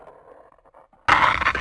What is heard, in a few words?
door trigger push; Hellboy